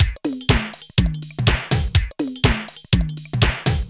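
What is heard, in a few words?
drum loops, right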